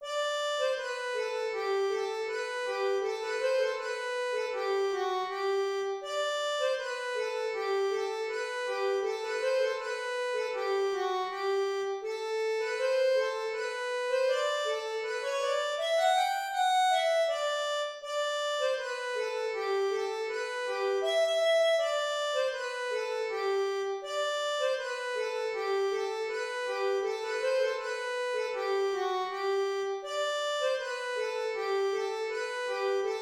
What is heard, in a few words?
deck the hall